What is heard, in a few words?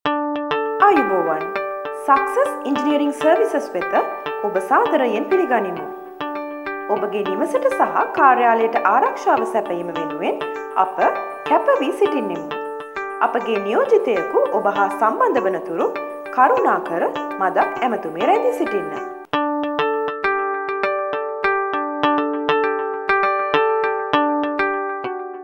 ring tone